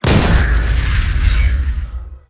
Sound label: fire boom